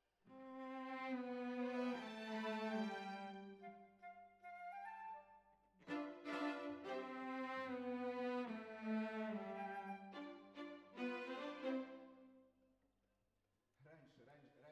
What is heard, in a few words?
Cellos Over